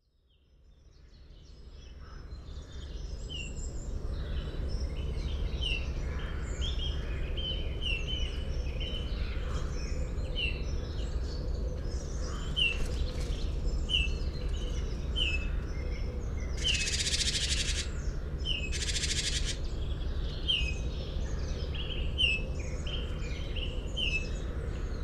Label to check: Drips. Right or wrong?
Wrong